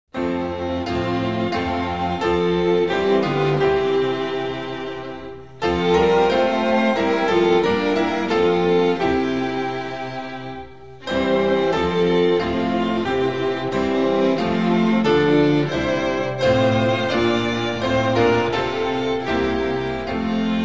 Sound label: instrumental; hymns spanish